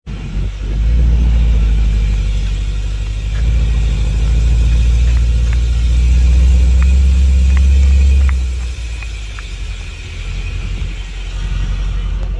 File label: s63 rear brakes squealing noise; s63 amg s63 amg 4matic s65 amg w222 v222